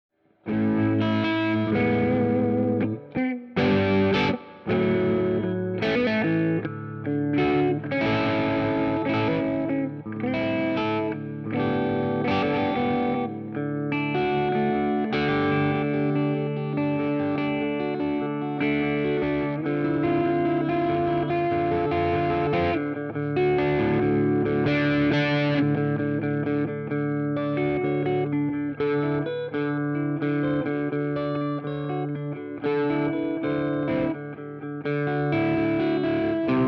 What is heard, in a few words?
H540 low gain song